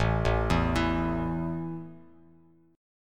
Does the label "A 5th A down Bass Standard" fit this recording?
Yes